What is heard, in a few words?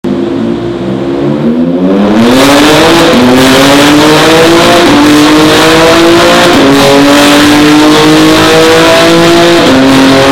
Dyno Sound M4G83 800PS